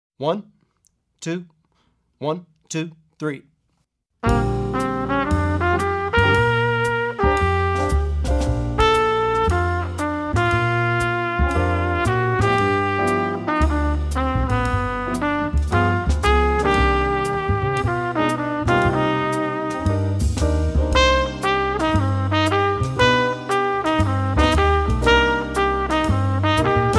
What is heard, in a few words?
jazz trumpet, with trumpet soloist; effective etudes for jazz trumpet